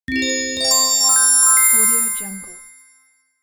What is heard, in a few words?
icy bell trills; electronplanet